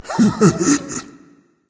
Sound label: laugh berserk; cuchulainn